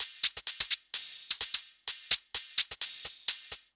Loops 128BPM, Drumloops

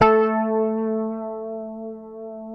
Fingered bass A3 R; Sampleheads; Will Lee Bass Library

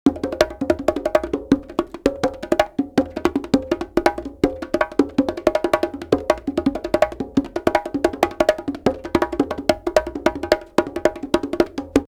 Live Perc Riffs; Jungle Frenzy 1

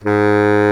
BARI SAX G#2; Greytsounds VOL-1

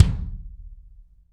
BD AMBIENT, Real Mega Drums; Best Service

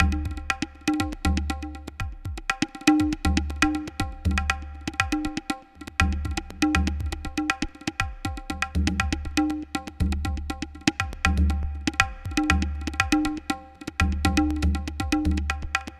Tablatron, Processed Percussion

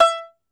Tenor Banjo, Plucked Strings

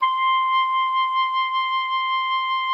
C6, OBOE; Xsample VOL-6

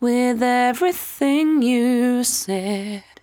74-5bpm B, Velvet Vocal; While U Could; Zero-G

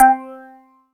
THROATY C4; Synth

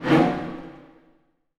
HIT, Orchestral; Orchestral Loops; 128 PSYCHO

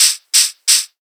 HH LOOP; USB Soundscan vol.27

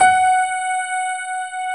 GRAND PF F#4, AKAI S-Series; AKAI S-Series CD-ROM Sound Library VOL-7; D.GRAND PF

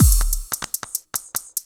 Breaks; neuro; Sinistarr

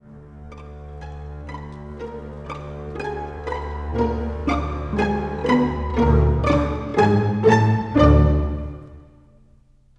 Strings; Sonic Foundry (Sony Creative Software); Orchestral Series VOL-4; Rock and Pop; Rock-Pop 14

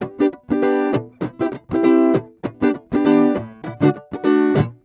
Acoustic Guitar 99 bpm G, African Guitars

